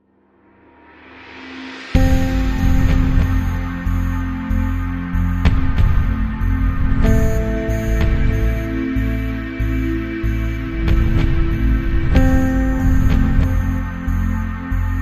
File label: No Theme Banjo and Mandolin